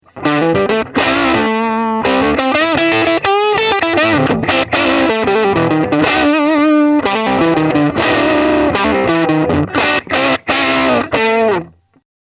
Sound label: Crunch